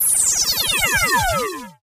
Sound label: laser, lasers